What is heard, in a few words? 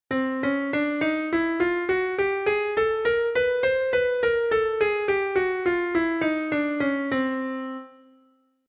C Chromatic